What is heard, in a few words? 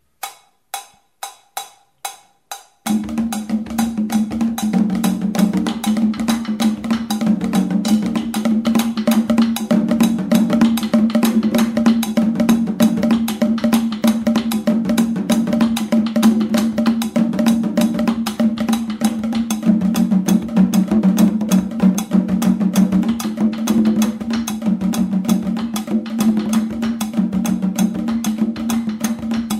congo laye